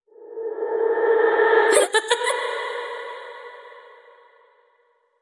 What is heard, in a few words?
Sinister Kid Laugh